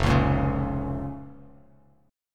F Suspended 4th Sharp 5th F Bass Standard; strummed; 1,4,8,6; JGuitar

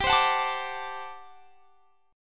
G Major 7th Suspended 2nd Suspended 4th G down; GM7sus2sus4; strummed

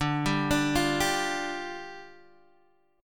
D Minor Double Flat 5th, down, Guitar, DADGAD

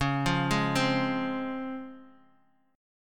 Db Major 7th, Guitar DADGAD

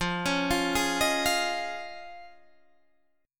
F Minor 7th Sharp 5th, Fm7#5 chord, guitar; JGuitar

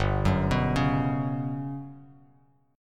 G Major 7th Flat 5th, Bass Standard; arpeggiated; JGuitar

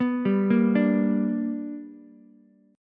B Minor Major 7th Sharp 5th B, Ukulele; arpeggiated; JGuitar

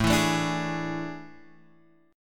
A Major 7th Suspended 4th A

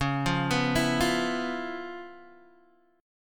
C sharp 7th Sharp 9th Guitar Open G, chord

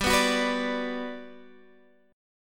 G Major 7th, G, down, Guitar, Standard; strummed; JGuitar

